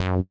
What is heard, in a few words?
F#3 bass